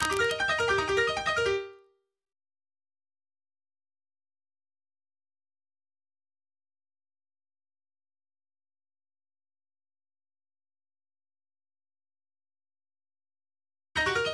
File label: Drop Arps DRY